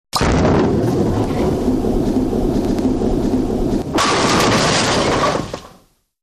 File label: bowling; Боулинг